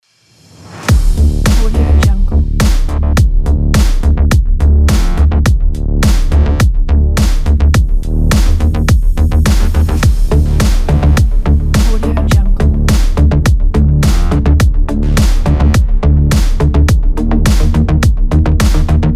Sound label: cyberpunk sport; okstockmusic